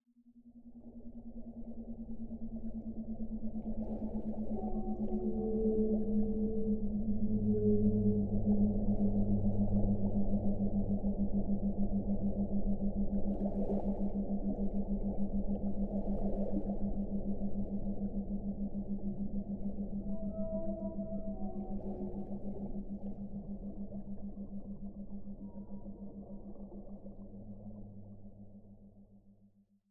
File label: Theta Underwater